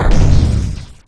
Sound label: sboom; DuelVoice